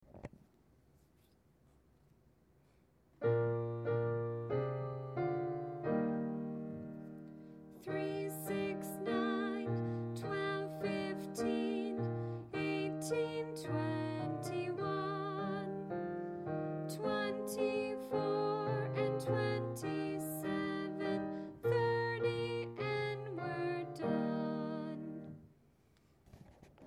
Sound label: three jingle bells